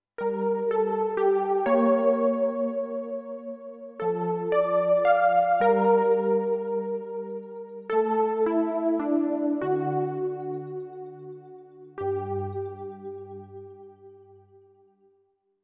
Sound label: Rain